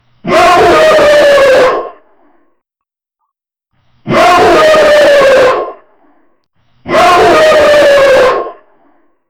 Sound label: a long lasting wolf